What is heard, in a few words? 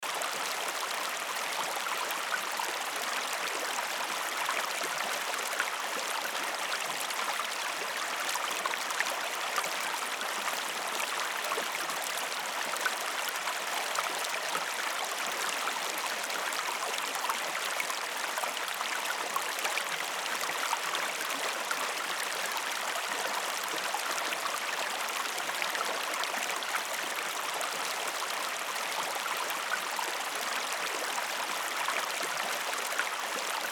calm river ambience loop; magic stone